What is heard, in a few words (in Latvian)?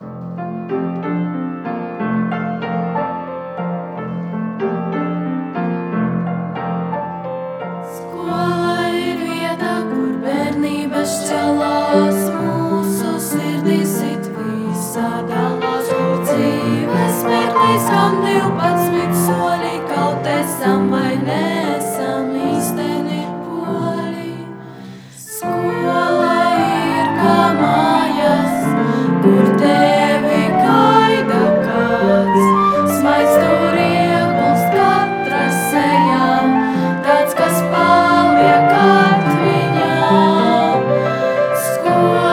Skolas Himna